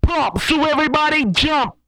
PUMP JUMP, R&B Dance, HIP-HOP, Vocal; TB HIP-HOP

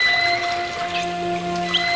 WET, WATER; AKAI S6000 CD-ROM - Volume 1

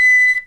WOODWINDS

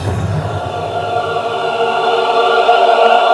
Praise choral; Urban Shakedown

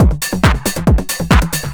percussion loops, Techno; PS-45

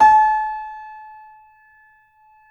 C7 F, The Ultimate Piano Collection; East West

